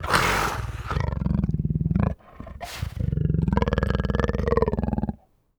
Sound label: leopard